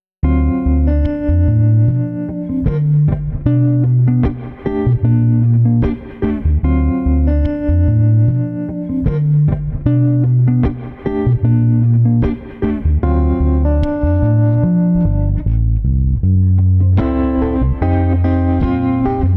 Guitar Bass Funky Chill 75

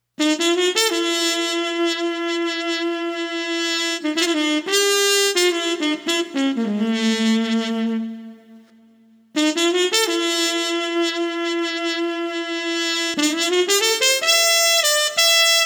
Street Trumpet Muted Chill 90

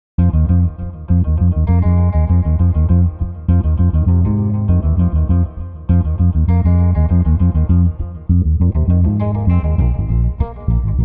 Street Guitar Combo Cool no Drums 100

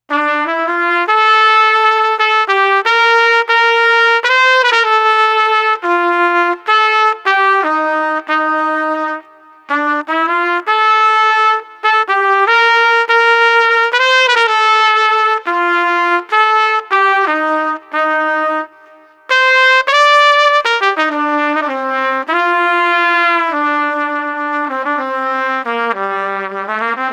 Street Trumpet Mournful 100

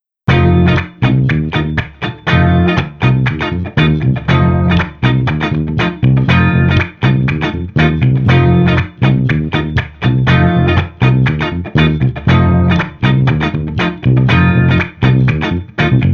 Street Funky Keys Trio no Keys 120

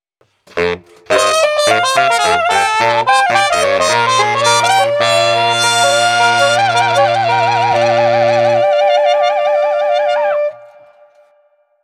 Street Brass NOLA Transitions

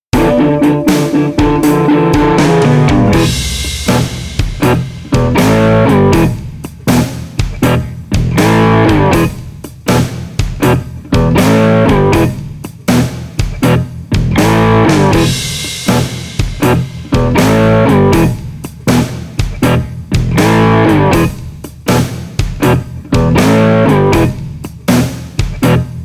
Blues Rock Slow no Leads 80

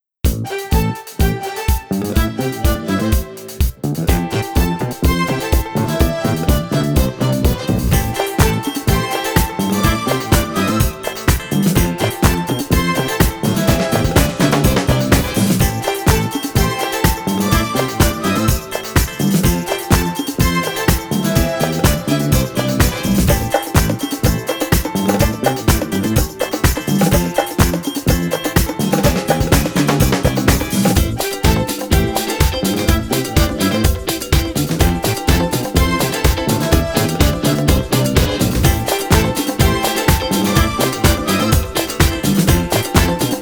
Smooth Jam

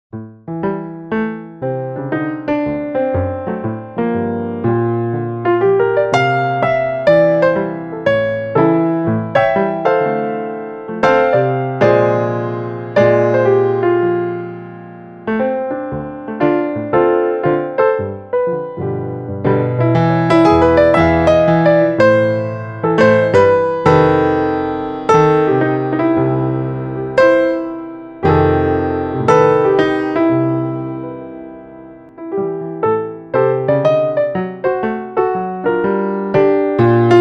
Carnival Lounge 125

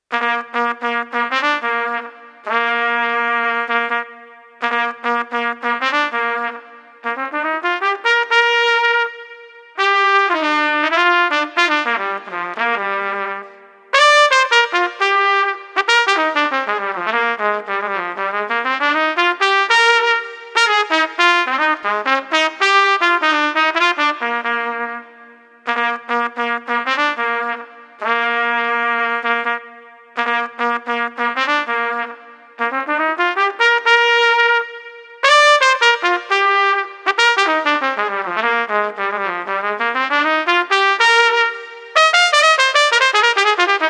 Trumpet Joyous 100

